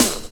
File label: DR. LOOP; X-Static Goldmine 3